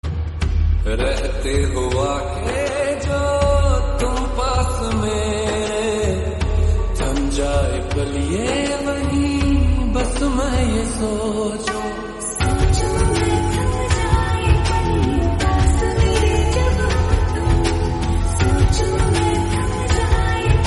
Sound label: lofi mix hindi